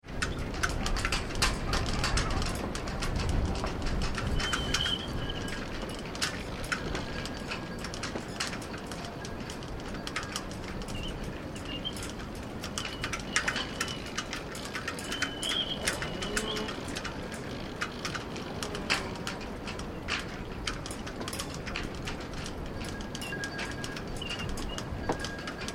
Wind Through Yacht Rigging, Wires against mast and other rigging; very distant chainsaw; Wind Sound Effects